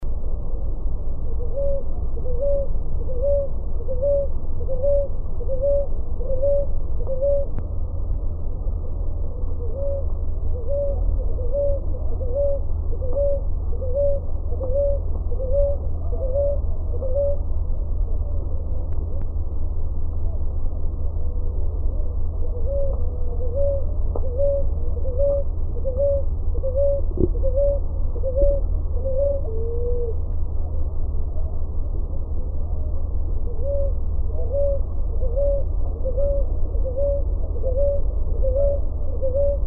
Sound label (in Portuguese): pombinha roxa canto